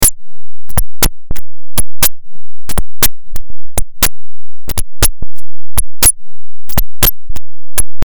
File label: fm7 patches